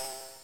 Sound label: Ball Flies In; SNES; Bill Laimbeer's Combat Basketball